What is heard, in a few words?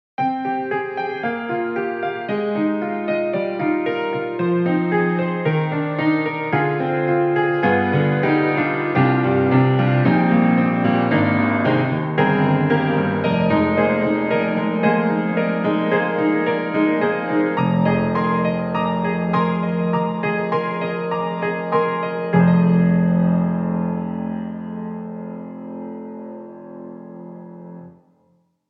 Studio Grand; XLN Audio; Addictive Keys